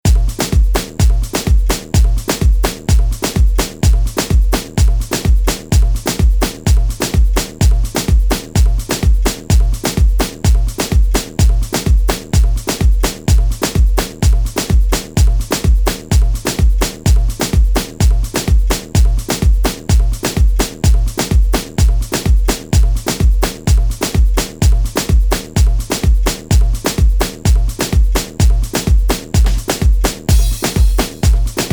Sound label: beat